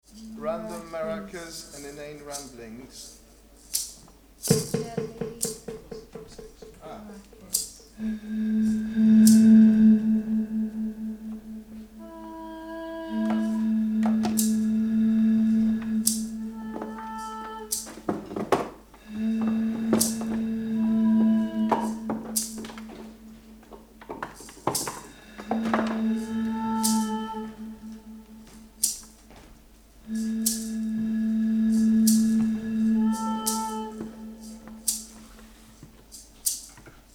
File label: Random Maracas And Inane Ramblings; Cube Orchestra